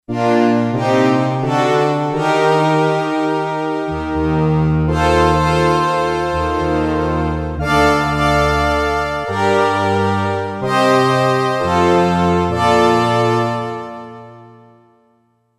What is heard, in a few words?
Ensemble 2, Yamaha DX11; Factory Preset Voices